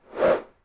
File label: Arrow Fly; Civ4 warlords